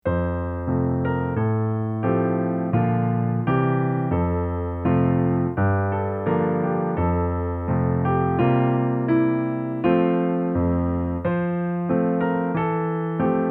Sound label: Stride LH